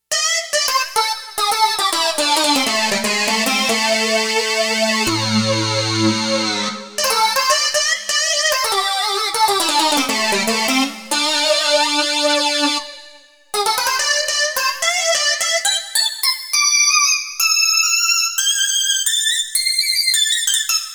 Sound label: SYN LEAD 2, Roland JX-1; Factory presets